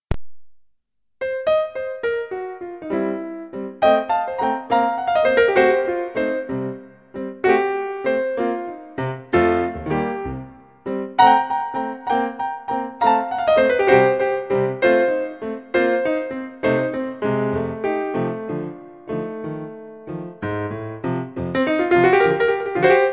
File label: blues snippet